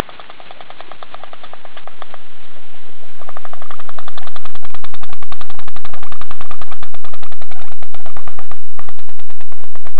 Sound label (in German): klappern